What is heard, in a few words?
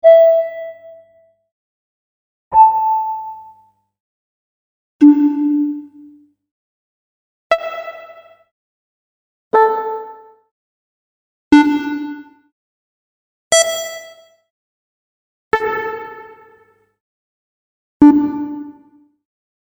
Tenorion